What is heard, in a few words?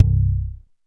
CROSS BASS C2; East West - Global Sounds